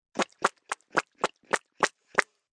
Lips Spitting, Mouth effects, 1 child, pops, mouth farts, several, human voice, kid